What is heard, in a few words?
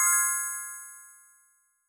confirm, tones; inteface sfx pack 1